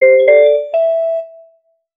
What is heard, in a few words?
simple notification; simple notification for a